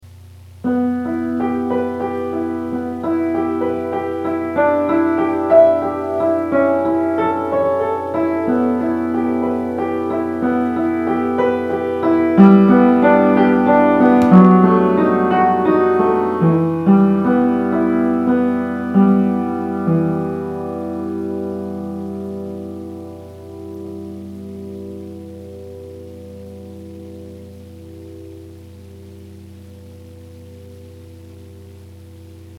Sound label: piano